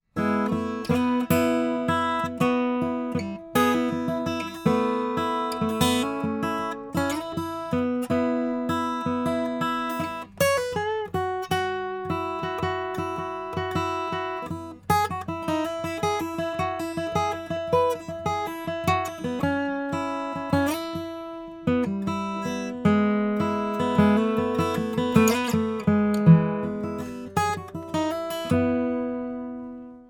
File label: comp guitar out in; audio examples from the book